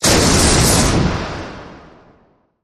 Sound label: Hard Crashy Blast Impact